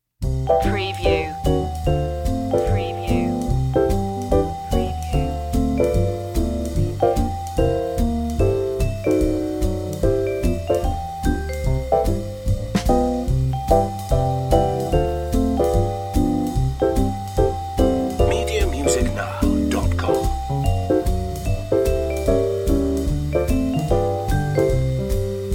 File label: playful sunday no whistle